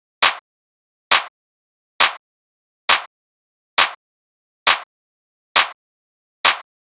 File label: Clap Loop 135, Techno